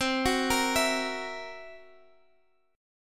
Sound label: C 7th Flat 5th